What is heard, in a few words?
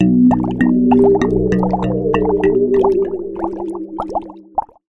cauldron